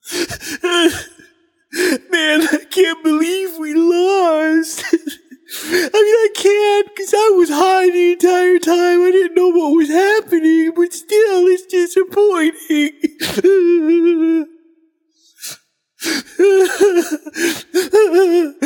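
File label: nonverbal boohoo; smite; voicepack; merlin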